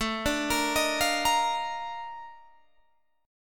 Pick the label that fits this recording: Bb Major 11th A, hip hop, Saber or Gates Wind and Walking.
Bb Major 11th A